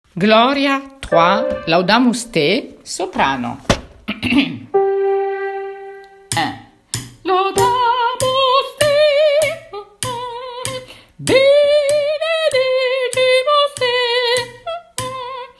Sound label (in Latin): Laudamus Te soprano; Gloria de Vivaldi